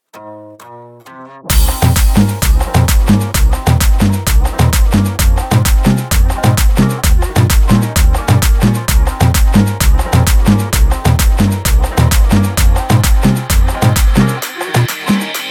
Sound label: intro outro 130 bpm